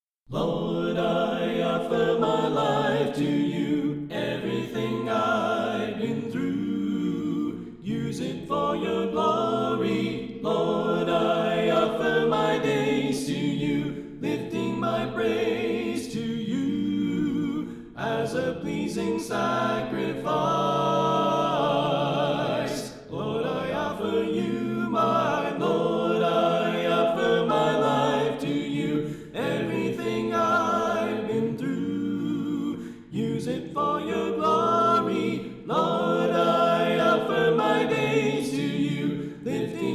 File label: Alto Left